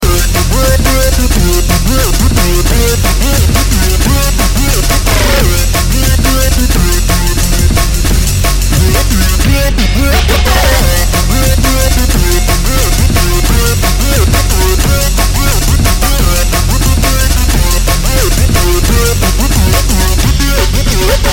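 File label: dnb music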